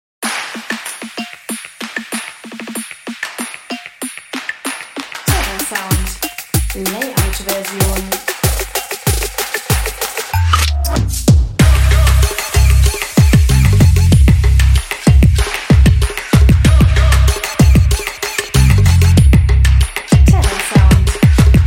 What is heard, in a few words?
line of drums; terrasound